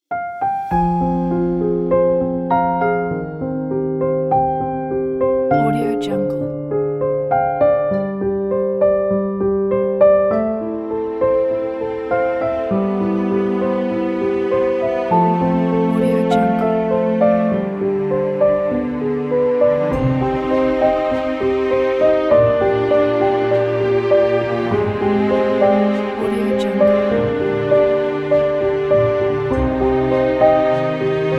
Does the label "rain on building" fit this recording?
No